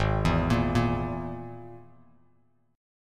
Ab Suspended 2nd Sharp 5th, Bass Standard; arpeggiated; 4,7,8,3; JGuitar

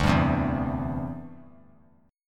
D 7th Suspended 2nd Suspended 4th D; D7sus2sus4 strummed

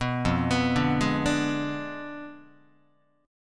F Diminished 7th F down Guitar; 2,1,2,0,0,1; Fdim7 arpeggiated; JGuitar

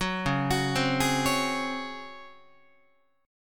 Db Major 7th Suspended 4th, Guitar; arpeggiated; JGuitar

